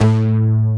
NOIZE BASS; Masterbits; Dance Trax

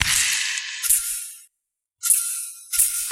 Emery Percussion, Acoustic Beats; Sonic Foundry (Sony Creative Software); Myths of Technology

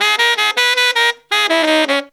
160 bpm, horns; Zero-G; Phantom Horns

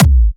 Clubby Kicks, Bassdrums

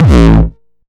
909 Dist Reso - A1, Kicks; Drums; Mbase 11 Kicks

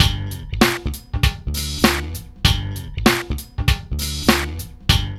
LOOP

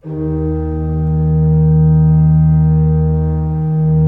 D2, Cathedral Organ; Propeller Island

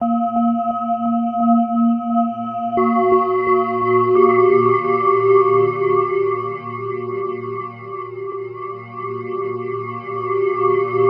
Florida Melody, Drum n Bass, Synth; KJ Sawka; Drum n Bass & Beyond

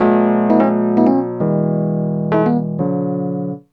KEYS LP, Drum & Bass, 140 BPM; Ueberschall; Drum & Bass Flash Trackz

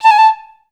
A4, Orchestra; Best Service; Peter Siedlaczek's Advanced

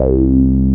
A BASS; CMP - Analogon

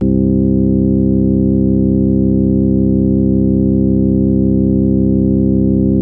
ORG C, ORGAN B3 HAM; Best Service ProSamples vol.55 - Retro Sampler; AKAI

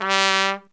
T G C, TRUMPET FX; Zero-G; Phantom Horns

